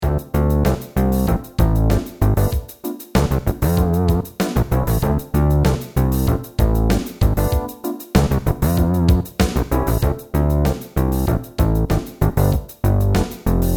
Raw, Funk Disco Basses; UA Theme Packs

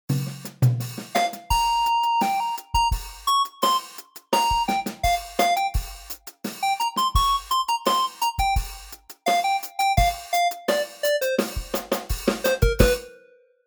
Lead Square Open; Ultra Analog Session

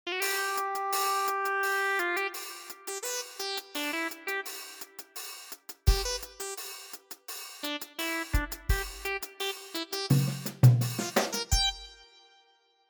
Lead Blazing Red; Ultra Analog Session

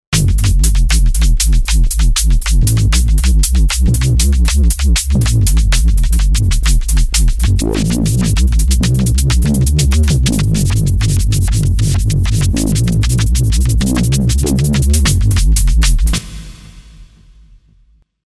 DnB Beat Maker; UA Masterclass; drum and bass

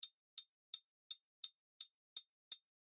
Reso Hi Hat; UA Masterclass; Trip-Hop

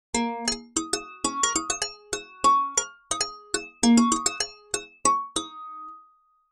Inharmonic Toy; SS Masterclass; Damper

